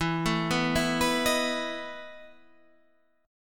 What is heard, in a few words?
E Major 7th Suspended 4th; chord shape